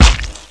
corpse thud; Ferazel's Wand Demo

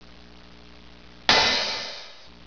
cymbal

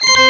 Organ; Amiga 8-bit Sampled Voice; musicweb; instruments

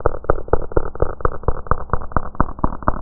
Dozer; SimCity2000™ Interactive Demo

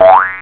oing; X-Mas Lemmings Demo

